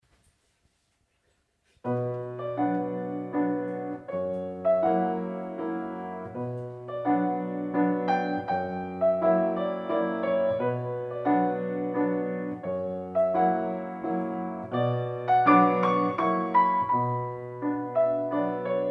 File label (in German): Schubert Walzer H Moll Op 18 No 6